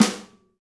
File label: Pop Snare; Snares